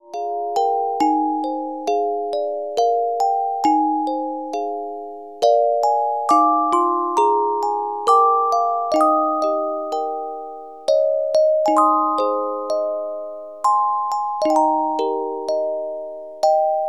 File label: Carillon Music Box Melodies